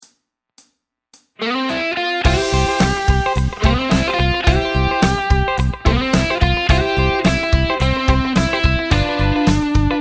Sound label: backing cutting